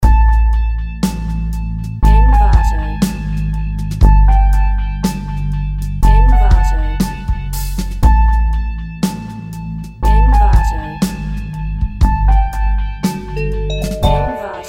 trip hop loop 60 bpm

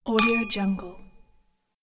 glass clink

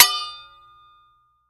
sword hit; prosoundfx

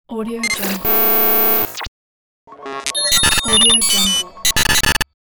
device glitch

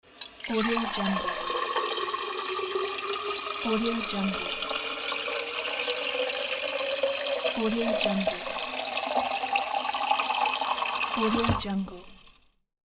water flow

